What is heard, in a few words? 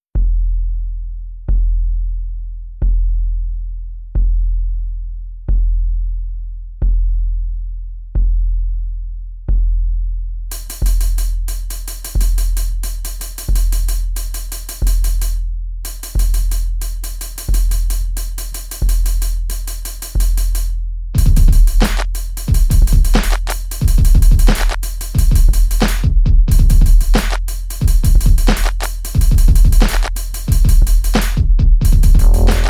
beat